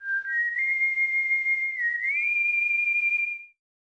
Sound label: Protoman's Whistle (Prototype); megaman 7 original soundtrack